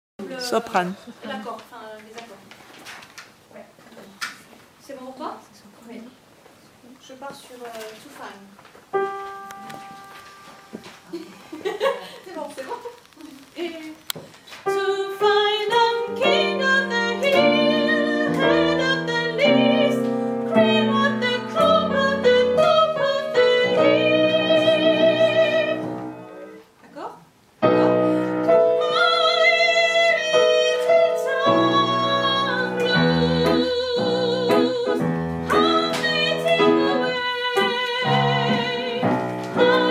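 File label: soprano coda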